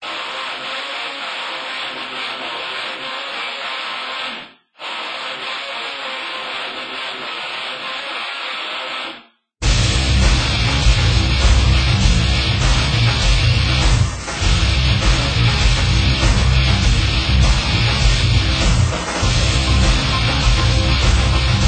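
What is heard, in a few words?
Music Ambient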